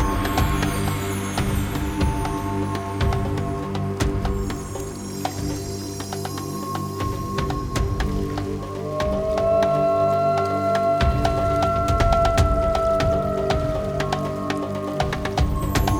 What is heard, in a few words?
ambience Bonus Game; jungle spirit